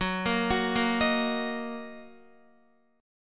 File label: Fsharp 6th down Guitar; F#6; arpeggiated